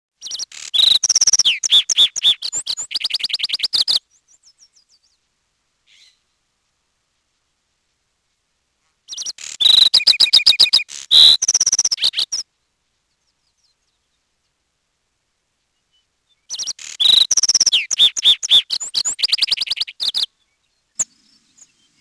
lark sparrow

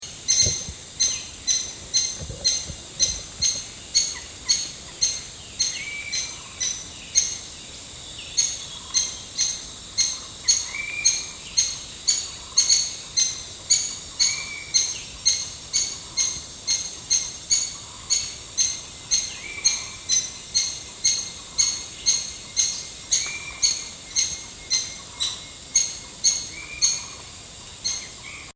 maroon woodpecker

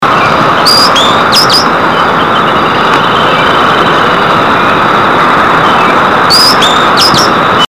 green bellied hummingbird

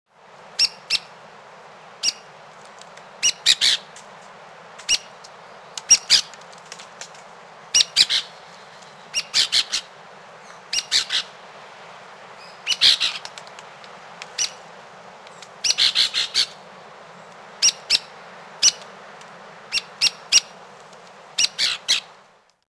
stricklands woodpecker

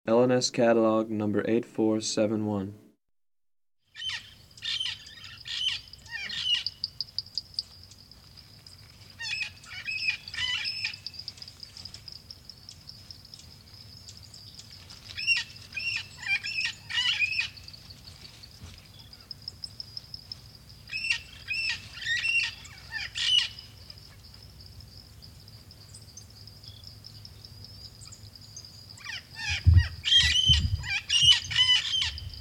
puerto rican parrot

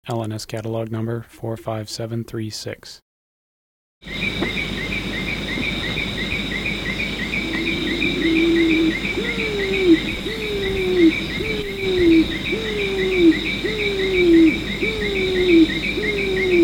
afep pigeon

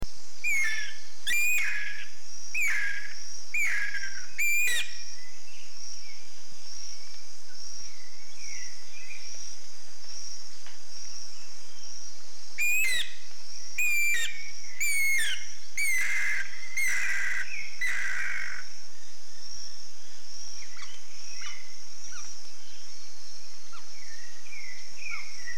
guira cuckoo

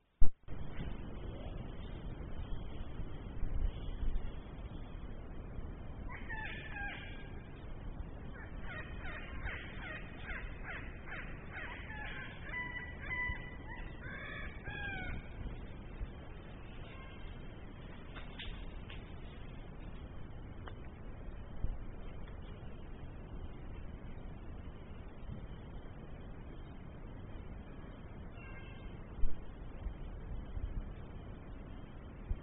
blue backed parrot

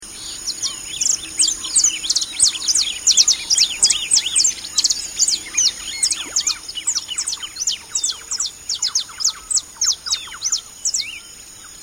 saffron finch

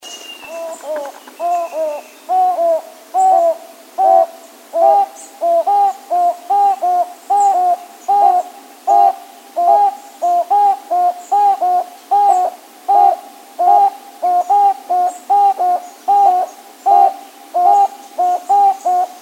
toucan barbet

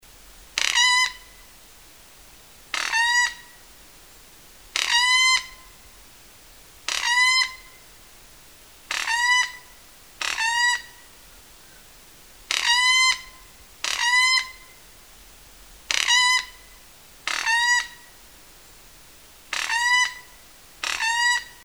plate billed mountain toucan

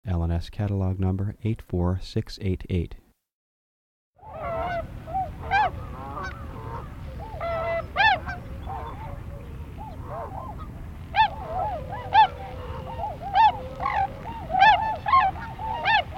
tundra swan

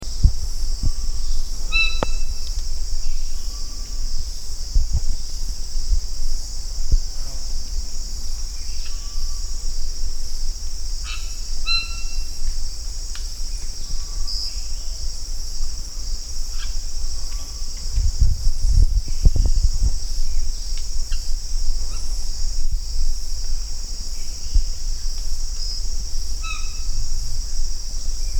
white bellied parrot

